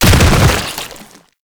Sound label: Bodyfall Default; Enemies; Warframe; Proto Infested